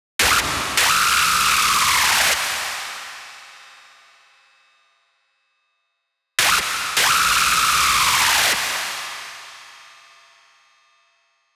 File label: Screech E 155BPM, Rave; Techno; Melody Kits; Stems; Ghosthack; 7 Free Rave Melody Kits 2025; Kit Ritual